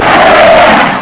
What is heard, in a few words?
barrel